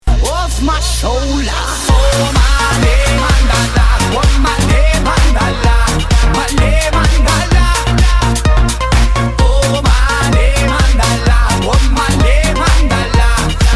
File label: surf